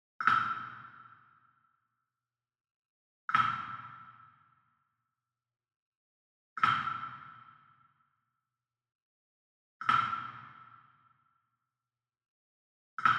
Wood Percussion Wood Block Standard Resonant Flam Far; boom library; Cinematic Strikes Construction Kit